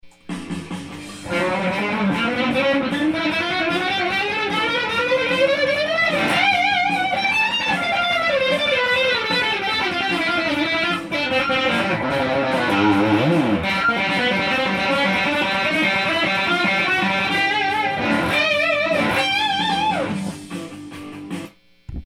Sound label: rocknroll solo